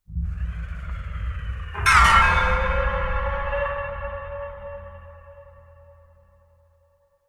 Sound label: Gore Downshifter; Mechanical Wave; Cinematic Feel